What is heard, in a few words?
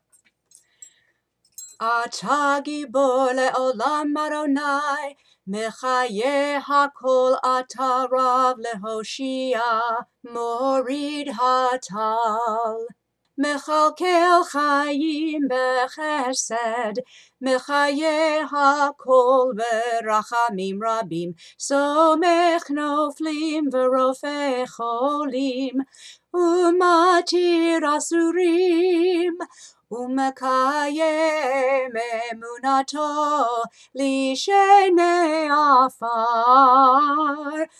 Gvurot Summer Chanted